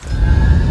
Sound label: charge start; tfa cso; holy sword